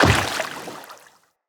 Step Water, Footsteps, Walk; Tenno

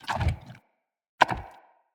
Corrupted Head Teeth Clack; Corrupted Bromius; Wrath Head

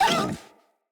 Kubrow Feral Vocal Pain

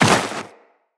Walk Snow, Footsteps; Tenno

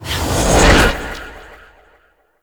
Kavat Summon Cast; Warframe; Khora

